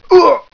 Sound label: pain; wartso; barney